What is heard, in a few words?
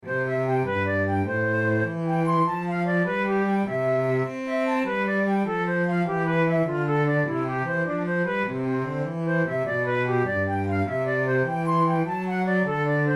allegro, flute